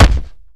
fall; ahlbi

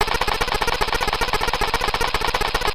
ratatata

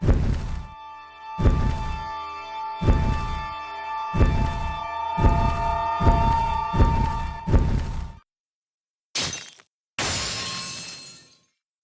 mandala break; amara

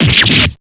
stab

